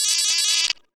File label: incorrect silly trill bling negative arcade fun; kids game